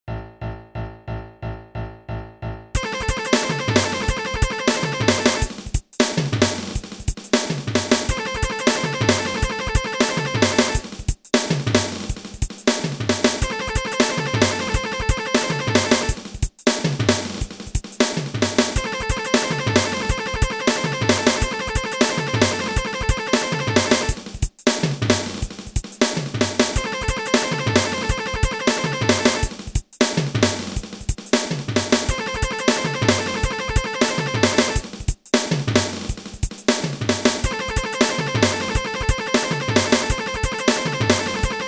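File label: Guitar riff, 180 bpm; speedpick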